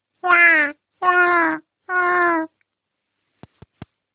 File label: baby hungry